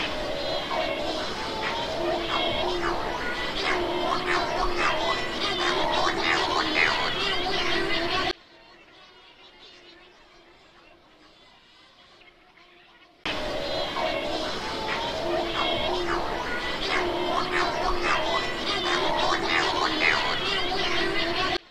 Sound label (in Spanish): pardela grande, Calonectris diomedea borealis; Aves; Procellariiforme